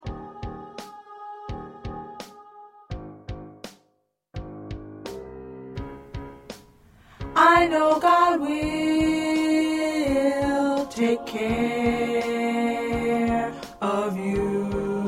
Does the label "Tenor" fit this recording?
Yes